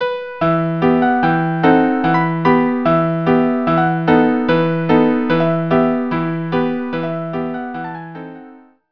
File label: trio